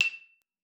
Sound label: E6, xylophone; Xsample VOL-4